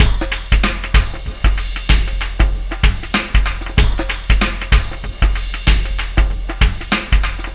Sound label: Oscillation Beat